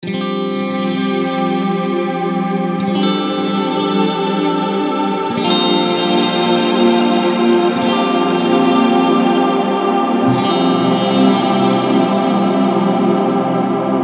swell; e-dome

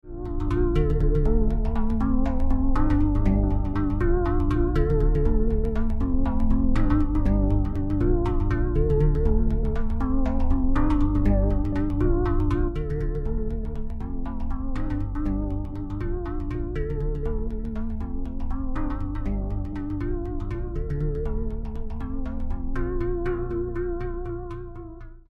ProphetX Drums Quad Mode; SRE330

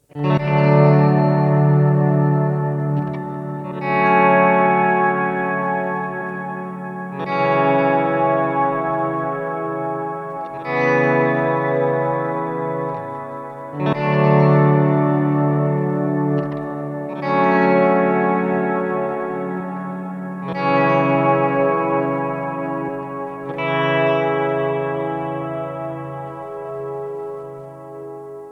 Slowverb; Boss RV-200

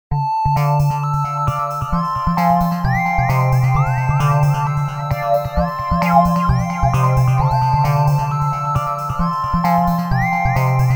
Absynth Air Seq

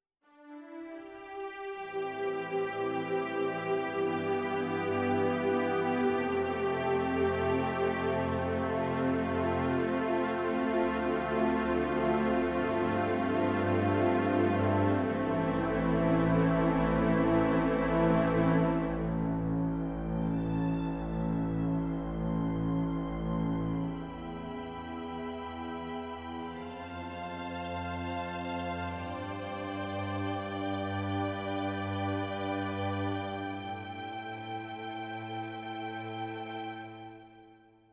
ES-100 Strings

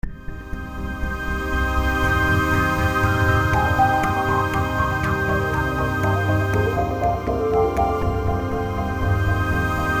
Ambient

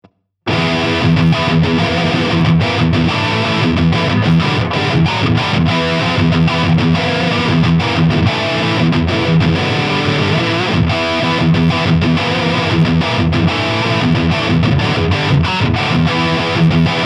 Godin Summit Classic SG High Gain

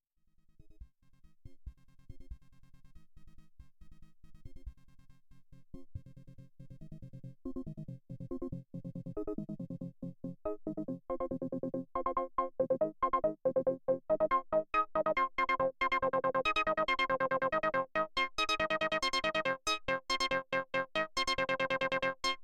doepfer a 121 a 138j

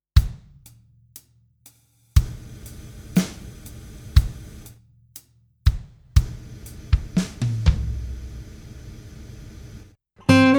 Freeze

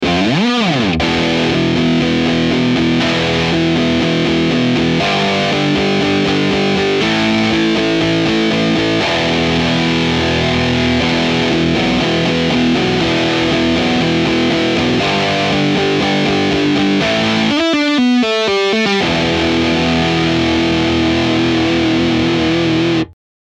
Rickenbacker crunch

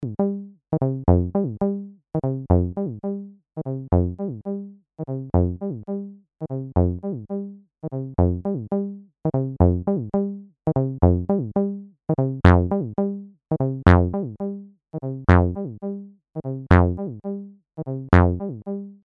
TD-3 Soft Attack

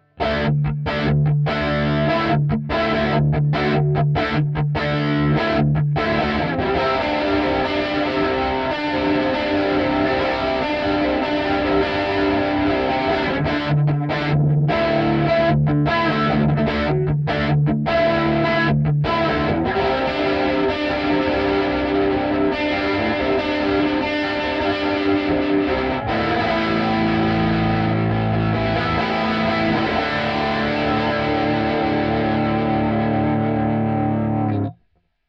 Zoom G2X FOUR Stereo Rhythm

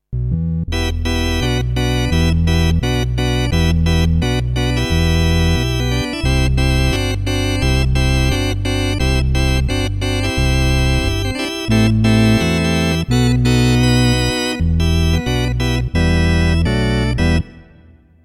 J7 The Vox

